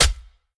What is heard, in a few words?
lance stab; smite; lancelot